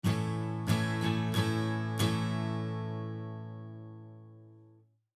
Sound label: A chord, guitar strumming